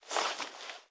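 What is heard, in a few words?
Weapon rolling pin unequip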